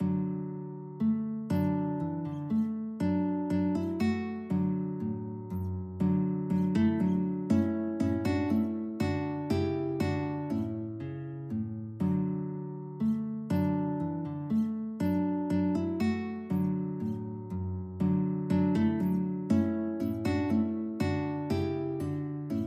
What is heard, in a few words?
Menuet, guitar; Music for the Fireworks